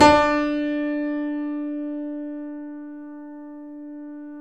KEY, YC7; Rhythm Section

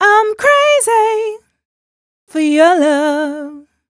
f mel d, fem Vocals

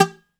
BOUZ PIZZ G 1

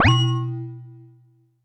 U220 FX Set, Drum Machines; Roland U220

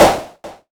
snare, Korg ER-1; Kit 7

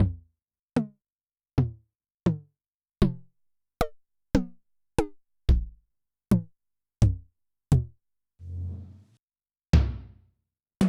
Yamaha DTXpress-II Tom

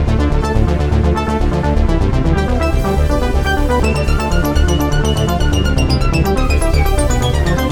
Arp in D, Keyboards

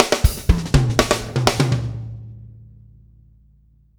DUGA, Backbeat

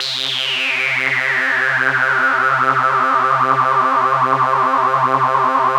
phaser; CMP - Analogon; DBL-PHASER

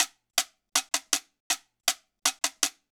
Quiro, Latin Percussion

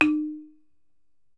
BALAFON, African Melodic

